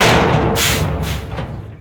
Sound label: Dump Truck Dump Stop; Trucks